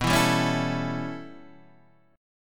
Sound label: A 7th B, chord; A7/B; strummed; JGuitar